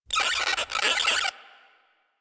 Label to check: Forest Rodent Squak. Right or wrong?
Right